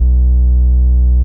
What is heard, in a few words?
BASS Subtext, bass; M8; Electronisounds